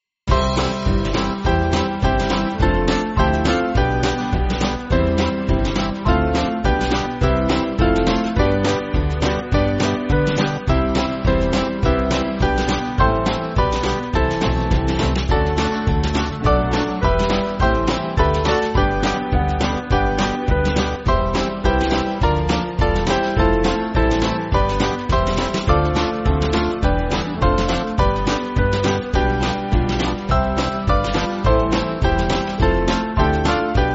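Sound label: Band, Backing Files for Churches; Now In The Days; Corona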